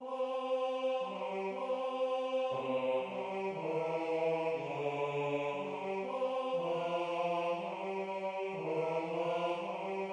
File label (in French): basse; fear no danger